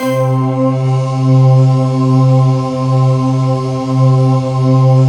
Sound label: chor; LION-CHOR; Q Up Arts; Dream Experience; DANCE